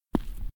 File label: foot left stereo